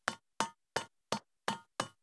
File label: Run Footsteps Metal; gamerip; Hero